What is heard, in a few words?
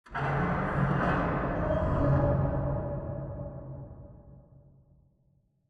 Robot Arm Pass Pickup Dist; Boomtown; Titanfall 2